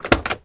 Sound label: Auto Door Open; Automobiles